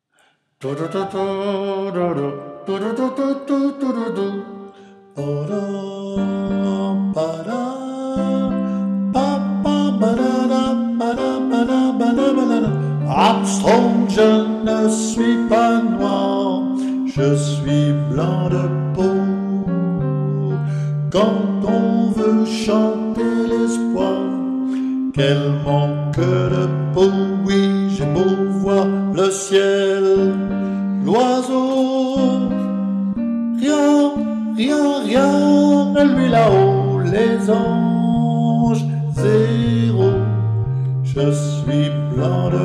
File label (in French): armstrong sop voix; soprano VOIX; "Go down Moses"